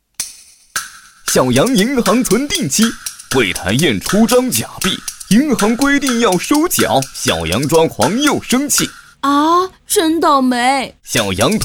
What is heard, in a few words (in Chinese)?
女 快板 防骗宣传